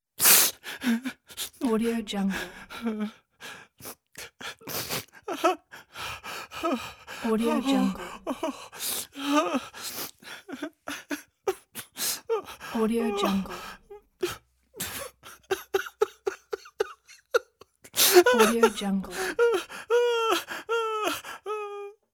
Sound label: male sniffling crying